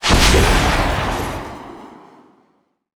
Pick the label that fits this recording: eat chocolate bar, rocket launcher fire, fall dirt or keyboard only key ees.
rocket launcher fire